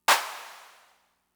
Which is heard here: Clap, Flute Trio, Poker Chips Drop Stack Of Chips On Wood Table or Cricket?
Clap